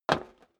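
step boots single metal dry single stair down, footsteps; schritte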